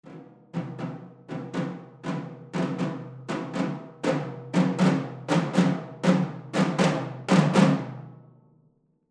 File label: Cerberus Tom Hi Ensemble